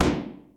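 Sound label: rkt explode, rocket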